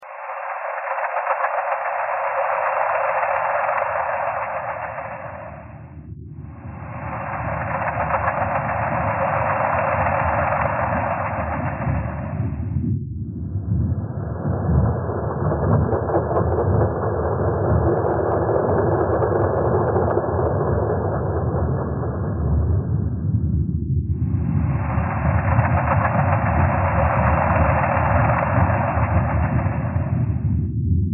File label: thread harmonic